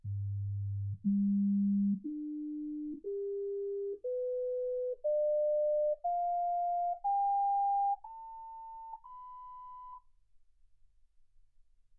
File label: sinus tones 48kHz